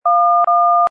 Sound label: old tone